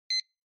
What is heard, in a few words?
Clock Alarm Beep